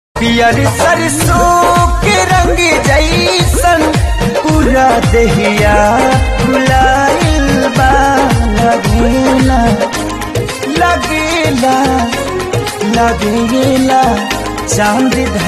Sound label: Ringtone